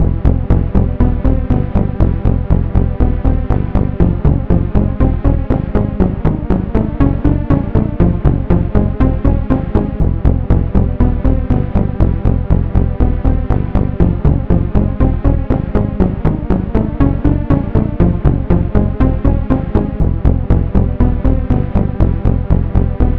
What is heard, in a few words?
Synth Loop